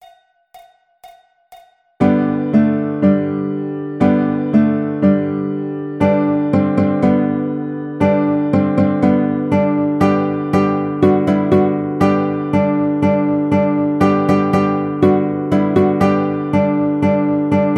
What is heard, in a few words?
Three Blind Mice Baritone In G, ukulele; Key of C (G for Baritone); Lesson #7: Three Blind Mice; Baritone Solo